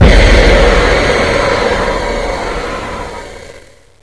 buzzer booo!; svencoop; sence; mistake coop

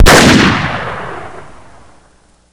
sniper fire; svencoop; hl2

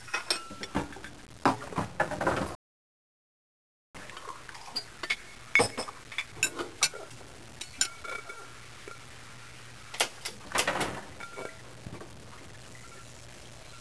buzzer stolovaya; svencoop; sence; mistake coop

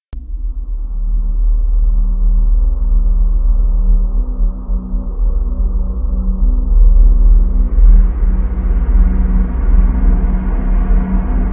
ambience; svencoop; st mission; music

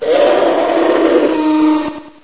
attack; svencoop; friendly

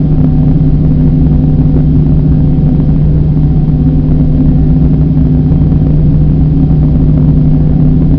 machine loop; svencoop; buro

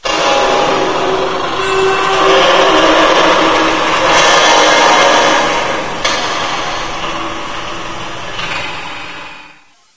buzzer; svencoop; sence; mistake coop

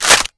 ar2 bolt pull; svencoop; hl2

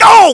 pain; svencoop; scshogo; fallenofficer

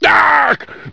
pain; svencoop; st allies; lowell

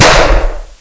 revolver; weapons; svencoop

